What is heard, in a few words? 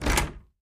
Attic Closet Door Clo